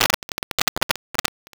buffer fuct loops

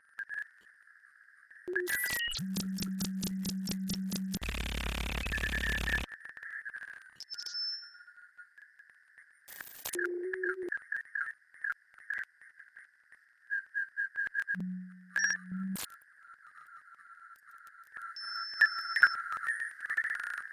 birds Of Madrid

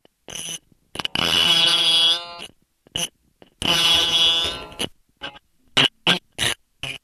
10 fingers X 6 strings

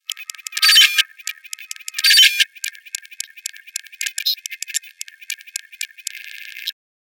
stick ring, ringtones, microtones; neudeck

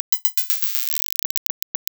fibonacci, pitched clicks & pops

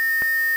sine 50k

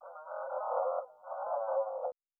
City Takes A Breath; city of the future

